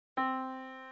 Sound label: yamaha Disk